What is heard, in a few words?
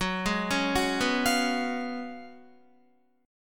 Gb Major 7th Suspended 2nd Suspended 4th, Guitar, GbM7sus2sus4 chord; GbM7sus2sus4 arpeggiated; JGuitar